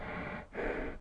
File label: horse breath; Mount&Blade Warband